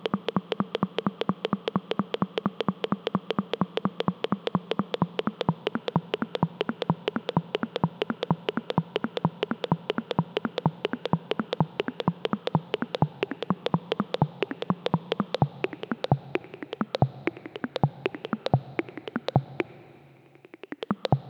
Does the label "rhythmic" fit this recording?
Yes